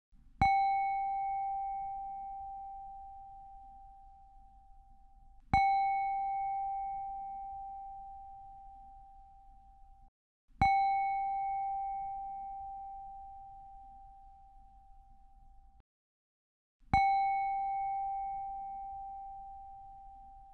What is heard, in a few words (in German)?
klangschale blau